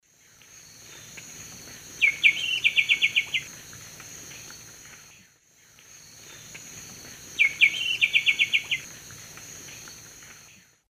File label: Pachyramphus polychopterus, song; S. America; Eastern Lowlands